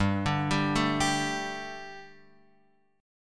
G Suspended 2nd G, down, Guitar, Open F; Gsus2 arpeggiated; JGuitar

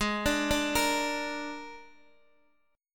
G sharp Suspended 2nd Flat 5th, Guitar DADGAD; x,x,6,7,5,8

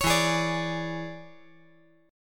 E 13th

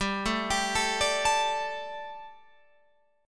G Suspended 2nd G, down, Guitar, Open F; Gsus2; arpeggiated

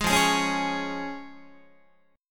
F Minor Sharp 5th, F, Guitar; strummed; 4A,4E,5A,5D,5Gsharp,6Csharp; 11,13,11,11,12,12; JGuitar

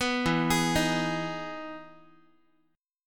E Major 7th E down Guitar; EM7 arpeggiated

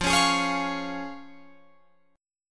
F Minor Sharp 5th, Guitar Open F, chord; Fm#5 strummed; JGuitar

